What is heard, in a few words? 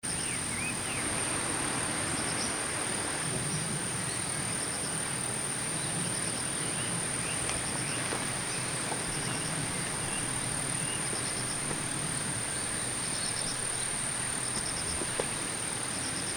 rice field